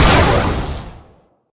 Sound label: fire, weapons